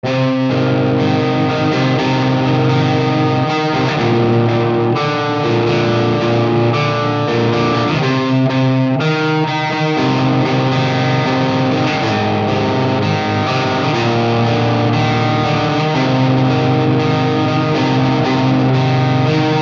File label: Electric Guitar Overdrive